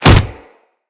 Generic Hit; Tenno

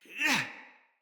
Attack Heavy, Battle Barks; Envoy

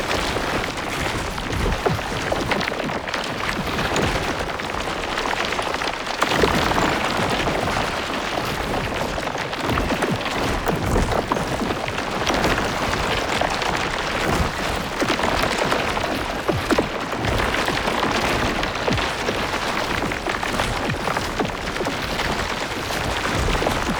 Uriel Brimstone Rock Loop; Warframe

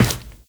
Run Default; Footsteps; Warframe; Characters; Tenno

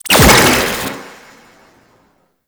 Trap Beam Fire; Warframe; Cephalon; Librarian Trap

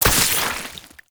Oraxia Brood Spider Web Detach; Warframe

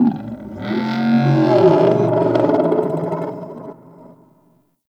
Metallic Creature Growl; Warframe; Tau

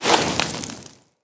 Sword Elemental Fire Whoosh; Teshin; Weapons

